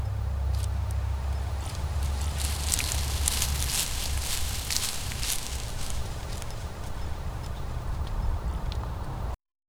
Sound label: forest footsteps rustling; elephant trumpet